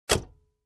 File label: Closing compartment